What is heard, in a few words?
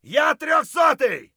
hurt light; Escape from Tarkov